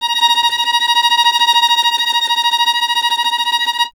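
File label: string violin, Vln Trem; Miroslav Vitous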